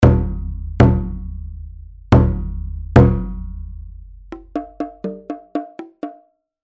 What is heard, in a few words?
Phoenix Orchestra Chinese Percussion